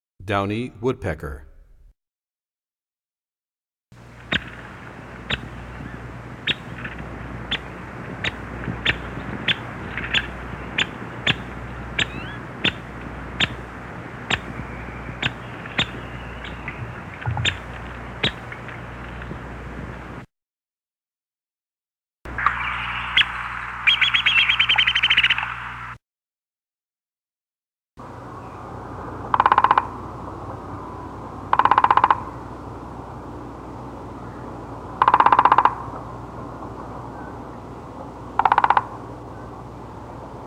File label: Downy Woodpecker; Animals; Birds; 101BirdSongs